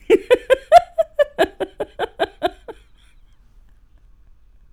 Latter

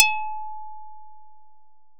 pling

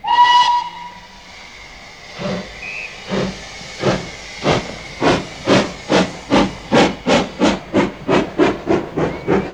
damptog

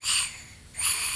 blokkf

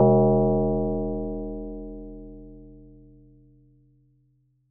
Klokke

